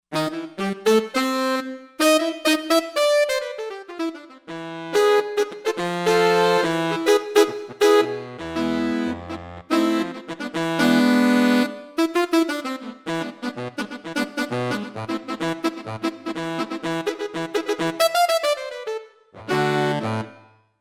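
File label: Reed Sax Section, synth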